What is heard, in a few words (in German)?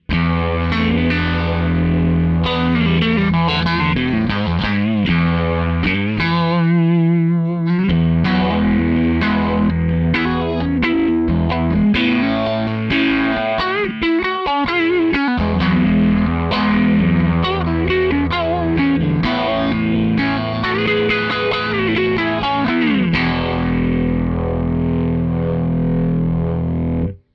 53er Bassman phazer